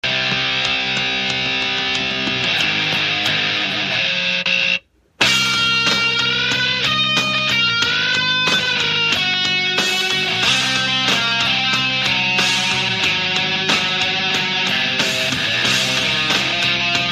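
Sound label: solo, ringtone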